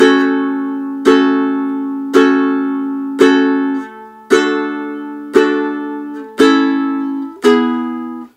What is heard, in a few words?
reggae, ukulele